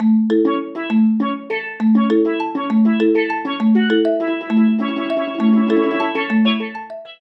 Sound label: Steelpan, alarms; Qua tab PZ LGT32